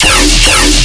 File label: Bass Hit E, Dubstep; Vortex Void; Ghosthack; Demolition